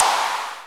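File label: percussion CS80, Analog Drums; Roland - Rhythm Section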